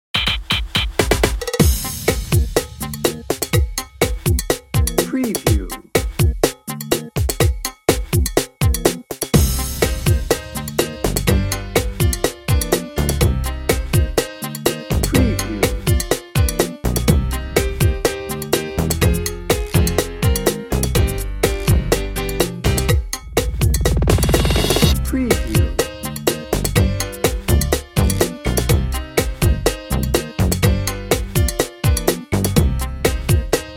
cha cha hip house no horns

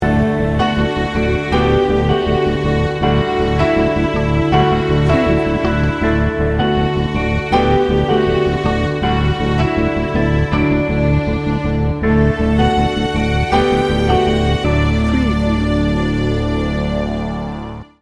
hope and inspiration

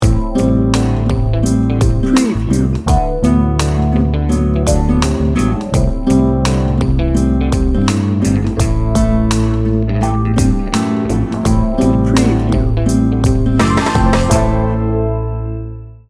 slinky soul

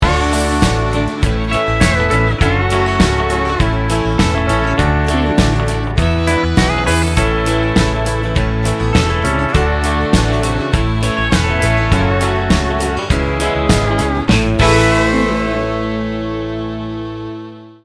texas bbq

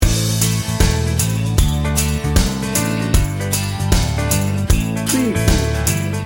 americana heartland loop

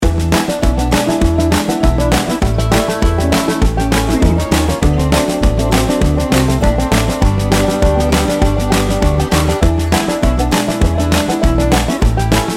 country folk two step loop

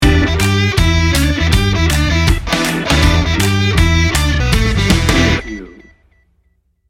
beer party sting bumper

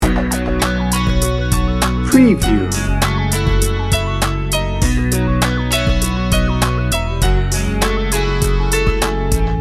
floating loop

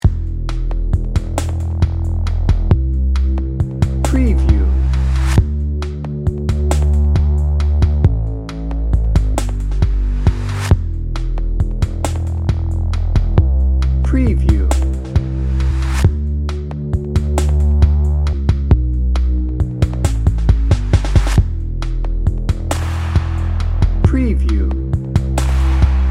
soulful dreamer dnb

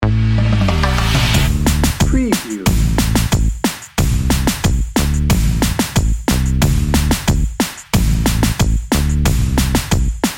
reggaeton happy upbeat dnb

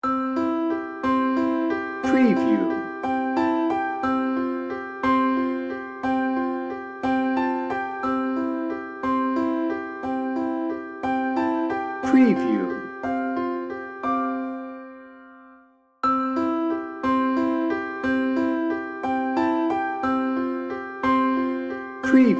dreamers and lovers piano only

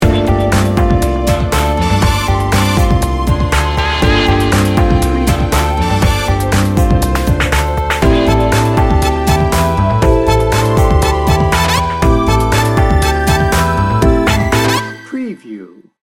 cool energy

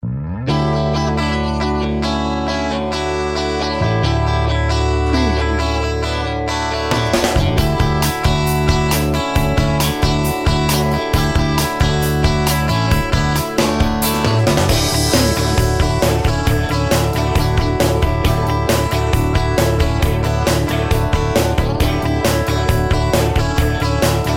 fresh and friendly pop bed

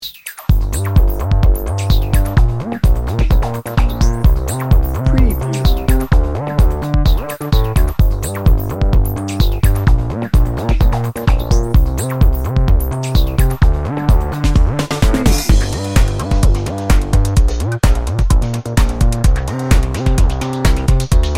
hi-nrg dnb

